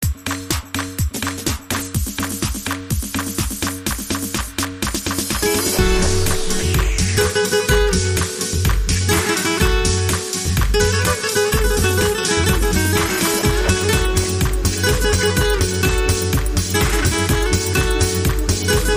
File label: Flamenco Fusion